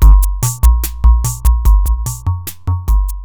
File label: steel drum